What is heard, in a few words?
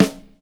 boomy acoustic snare g key, acoustic snare sound tuned to the G note